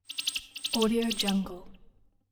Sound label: alien warning signal